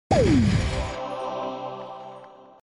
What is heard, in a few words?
revive; Subway Surfers